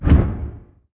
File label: step; unreal; titan